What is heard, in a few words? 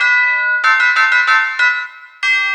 Bell, Trip-Hop Loops; Rondo Brothers; Sing Into The Machine; Sonic Foundry (Sony Creative Software)